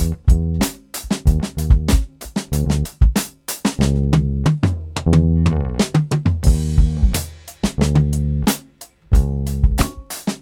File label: Closet Drums Freetime